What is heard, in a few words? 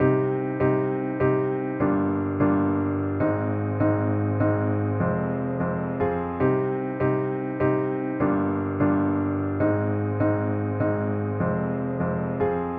Piano Loop 150 BPM C Min; Piano Loops; Future Bass; Instruments